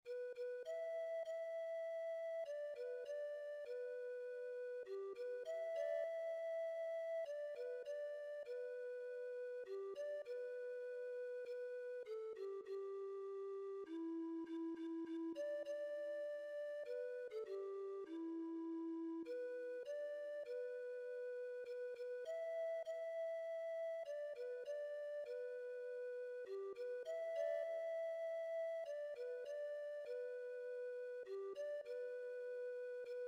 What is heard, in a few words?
PERC; Viracocha